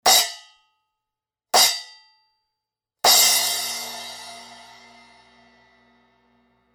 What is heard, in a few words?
zildjian splash crash choke